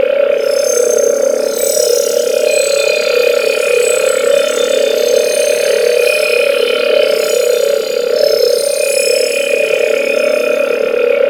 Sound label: PVP Orb Respawn Loop; UI; Pickups; Warframe